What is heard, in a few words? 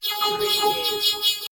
Scifi Production Element - CrossTalk